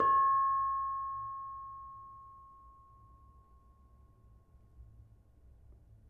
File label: Bonang Barung, gamelan; gravityharps; timbrebox